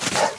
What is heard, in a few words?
dog jump